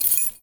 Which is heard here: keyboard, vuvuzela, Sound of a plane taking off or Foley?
Foley